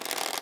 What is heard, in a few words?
Chinese Tower; Rabbids Multiverse